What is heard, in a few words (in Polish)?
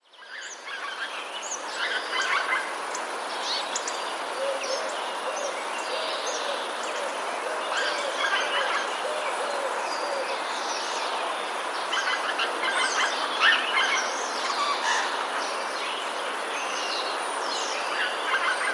sojki; sójki trochę jak dzikie gęsi